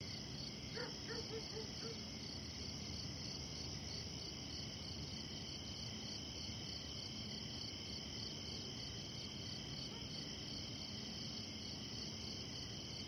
Ambient - Night; Transformers The Game

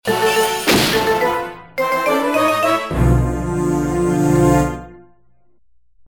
Ship Lost; Star Fox Command

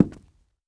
walk wood

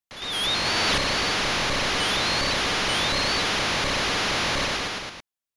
Cheer; pop'n music GB

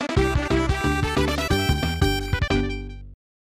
Stage Clear (Jungle); Donkey Kong Country 3; SNES

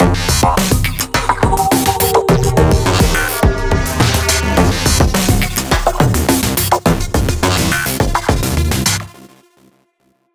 Fight Low Combo; Remember Me

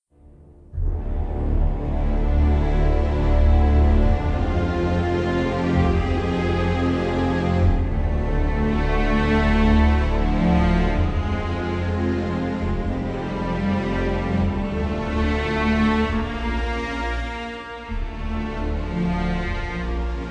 Music Idle; Zak Storm Super Pirate; mobile

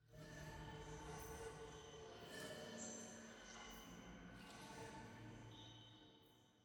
Pad High; Frozen Flame; windows

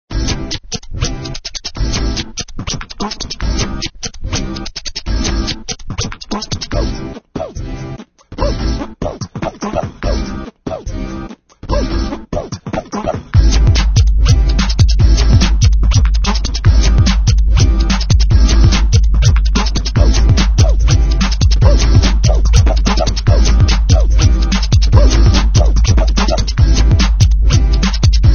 level music

